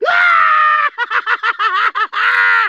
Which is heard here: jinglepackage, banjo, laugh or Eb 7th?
laugh